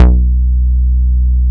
GERMAN BASS, Bass Analog & Synth; TECHNO; AKAI; USB Soundscan vol.12

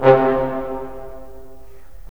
BRS, Roland LA Composer

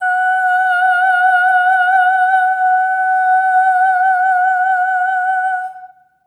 EH OH F#5, SOP; Spectrasonics; Symphony of Voices

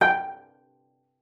cello pizz G5; 90 s Sample CDs; Xsample VOL-5

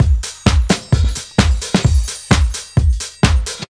crystalnacht, Deep House 130bpm

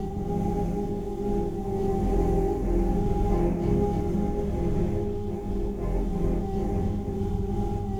Drone Ambient; Drums & Drones

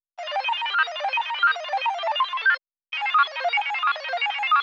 Arp

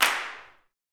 CLAPS; Vocal Planet Vol1 Gospel; CLAPS+SNAP

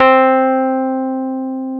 Electric Pianos; Wizoo Electric Pianos

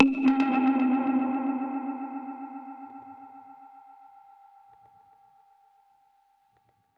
Perc Hit, dub percussion one shots; musicradar

